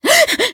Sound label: Voice damaged